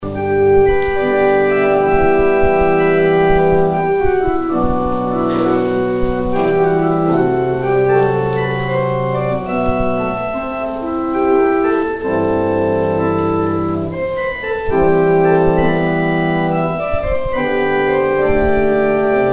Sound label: organ interlude